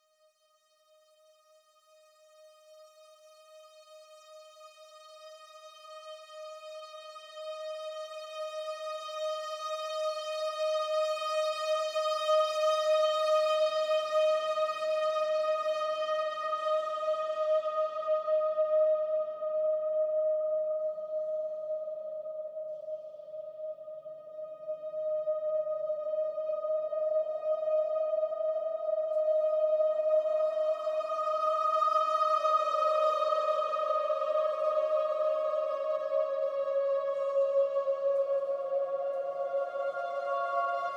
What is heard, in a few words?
World Ambience; factorio